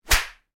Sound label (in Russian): korotkij chetkij zvuk udara